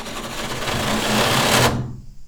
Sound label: Metal